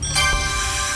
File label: right answer